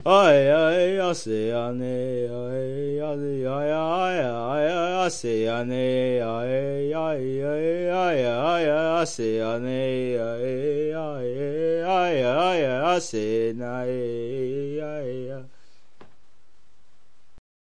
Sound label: ayaeha, Native American